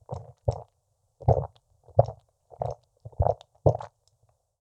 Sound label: swallowing gulping; Lewd SFX